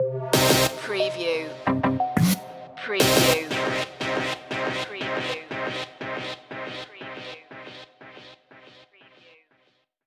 loaded stinger; minimalistic

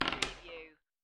Dice Throw One Die On Wood Table; lynne-publishing

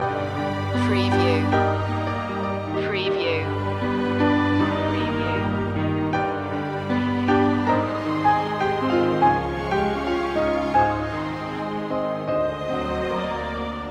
summer showers loop, magical

